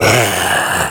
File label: death troll common, creep deaths; dota